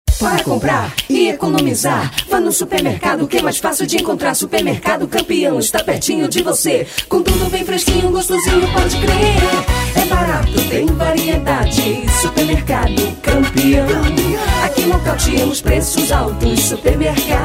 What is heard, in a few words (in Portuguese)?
jingle supermercado; jingle institucional supermercado campeão